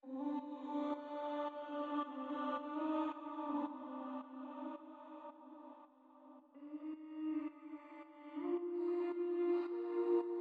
Vocal Ambience, 110 BPM G Min; Cymatics; Saint Tropez; Infinity Melody Collection

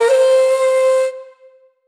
Snake Charmer One Shot - C; Cymatics; PHARAOH Beta Pack; Pharaoh Premium Drum Samples (BETA)

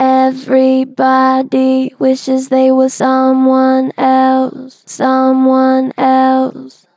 Pitch Flat Gentle, Vocals; Harmor Vocals

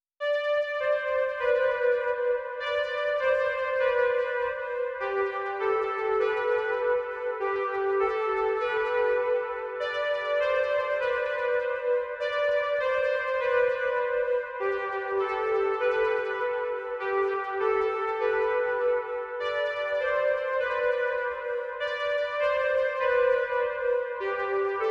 100 BPM E Min Pad, Ambient; Melodies; Loop Stems; Cymatics - GHOST (Beta Pack); Above It All

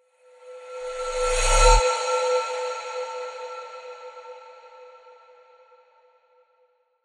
Whoosh

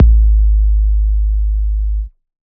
Bass, Dubstep 808 Basses; GhostHack

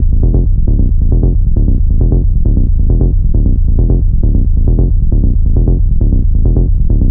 Bass Loop A 135, Techno; Ghosthack; AC2024 - Day 1 - Techno Sounds 2025

